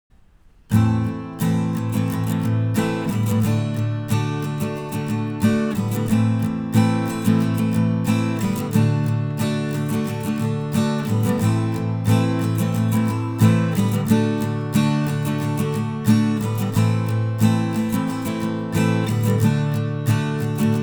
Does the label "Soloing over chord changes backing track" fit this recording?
Yes